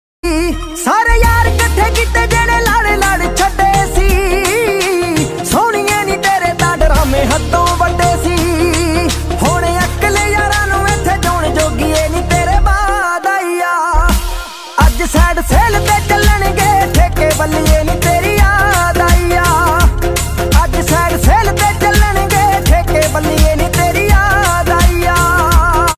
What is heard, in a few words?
Punjabi Song